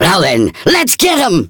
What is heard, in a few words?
attack